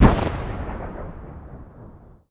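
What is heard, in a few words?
distant explosion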